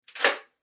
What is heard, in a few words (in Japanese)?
玄関の鍵を閉める音